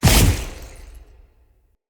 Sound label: stag energy drain enemy damage; Powers; Stag Pact